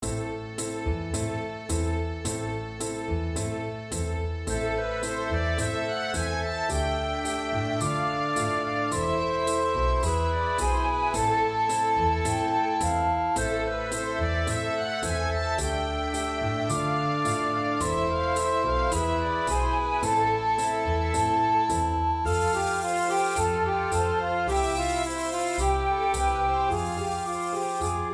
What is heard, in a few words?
Melodic Tango